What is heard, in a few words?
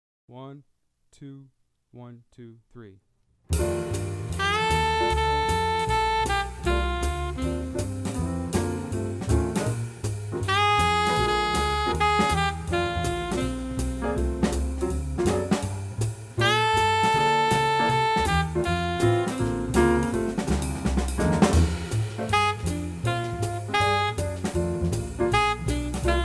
Groove Blues sax